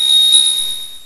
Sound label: Sfx Bell; misao